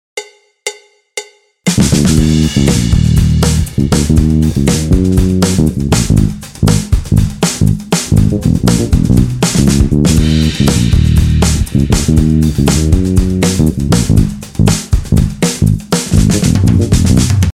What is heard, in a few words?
Ahead of the beat with laid back drums